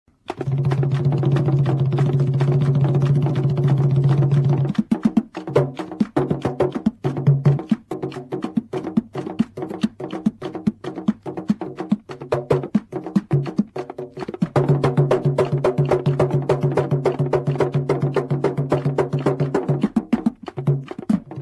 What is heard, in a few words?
afrika